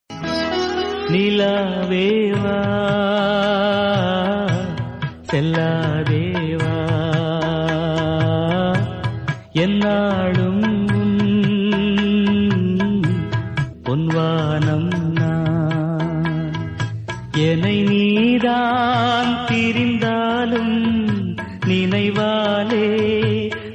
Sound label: Tamil song